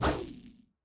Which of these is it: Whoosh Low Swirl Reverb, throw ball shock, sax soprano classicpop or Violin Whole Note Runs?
throw ball shock